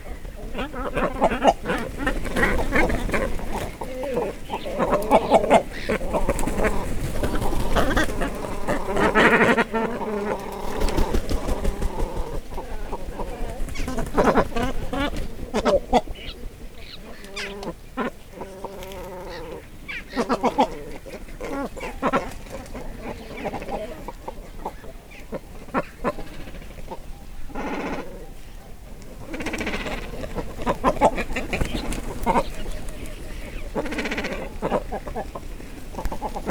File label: animals cormorants inside nest loud; mono DPA4061; SoundHolder